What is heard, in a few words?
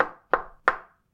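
knock on wooden pillar; knocking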